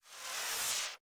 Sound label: LightBeam shoot